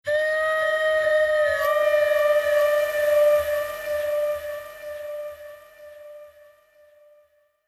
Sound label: trumpet